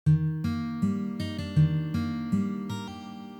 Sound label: Ornamentation Pull Off combine